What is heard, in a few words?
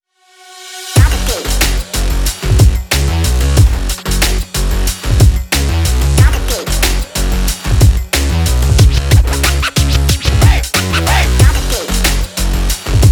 Racing Energy Breakbeat; quick race linux macos ps4 switch windows gamerip 2021